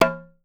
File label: ITOLELE, BATA; Voice Crystal - Steve Reid Definitive Percussion